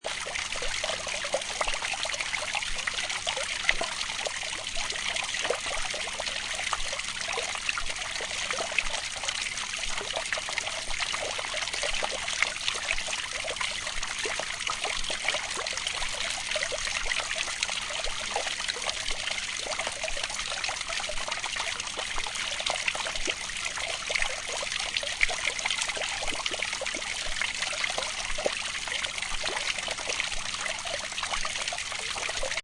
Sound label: Water Fountain Loop, Very small waterfall, water feature or fountain.; Light water trickle. Seamlessly looping sound